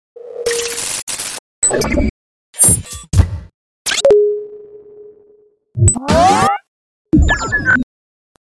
Noizes Space calls

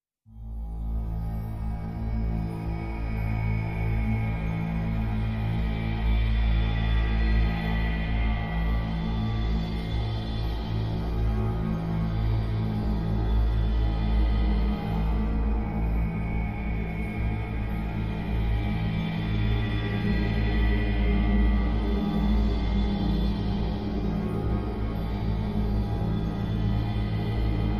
Drones Only

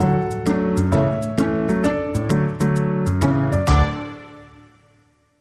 Bumper

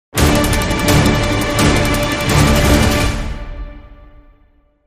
Dramatic Sting, Short

